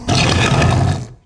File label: hoplite hit; DAoC; Toa; Gamestar